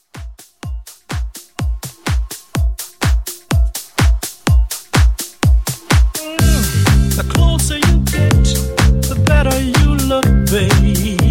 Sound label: 60s Re Drum